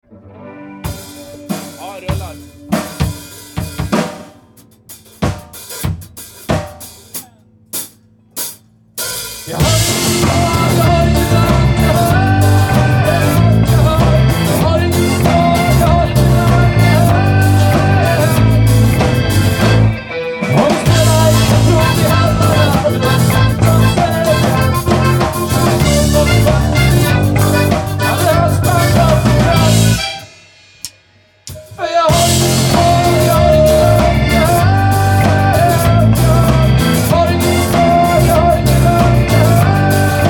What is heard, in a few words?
break; quattro caminos